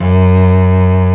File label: ARCO CELLO, Solo String; E-MU Producer Series Vol. 2; More Studio Essentials; Composer; Solo String Combo